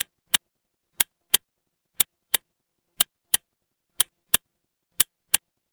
mechanical clock tick

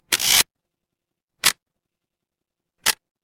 office camera

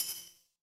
ui chime

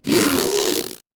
cartoon stretch

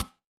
impact metal hit

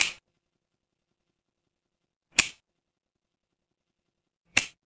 fire electric spark

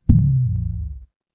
feedback fail

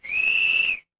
instrument whistle

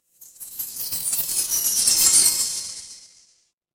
magic scifi spell

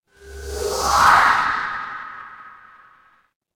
transition sweep

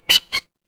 cartoon squeak

